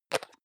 hygiene small cup close medium; haus-garten; badezimmer

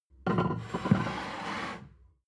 plate stack slide table, gastronomie; soundpool

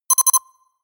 notification pager

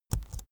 keyboard laptop enter press slow soft

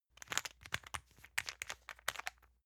toothpaste open rattle hard; haus-garten; badezimmer

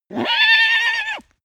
horse neigh short; natur-tiere; pferde

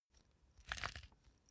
small pepper grinder glass ringing hard; industrie-wirtschaft; gastronomie

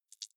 click noise, signale

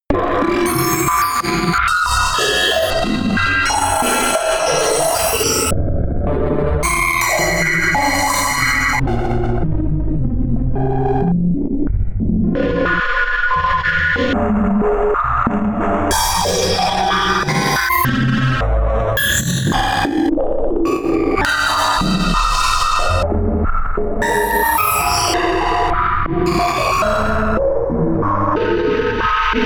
atmosphere extreme erratic craziness high

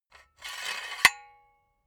silicone gun empty pusher pull out slow; soundpool

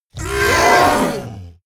monster monster scream; schreie

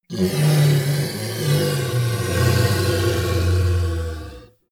monster inhale low; kreaturen

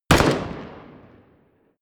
pistol distant shot; schusswaffen

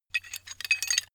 metal handling pieces

